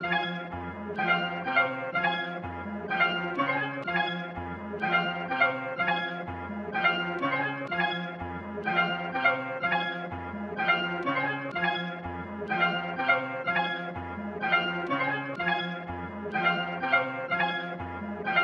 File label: 125 BPM F Min Processed Piano; Melody; Loop Stems; Cymatics; Sparkle Up; 2022 Melody Collection Platinum Expansion